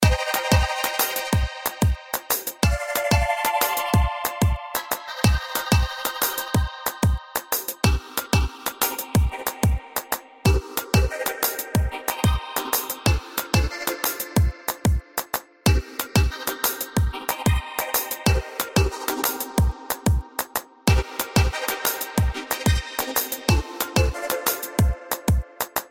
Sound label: ring atmosphere; htc; electronic